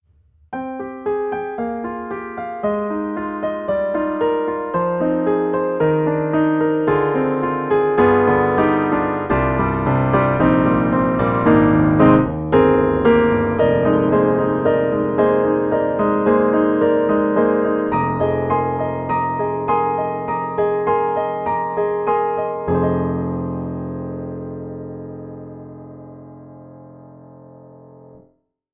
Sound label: Pianoteq D4 Intimate, piano; MODARTT S.A.S.